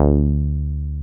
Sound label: 303 Basic D3; Drums In Your FACE!